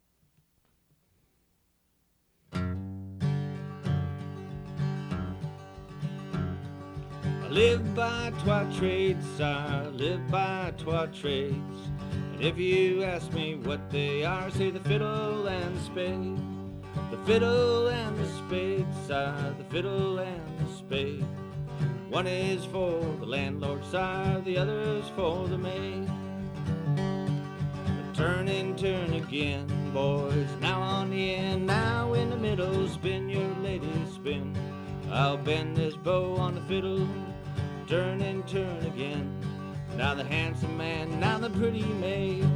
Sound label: This is the song performed by a fiddler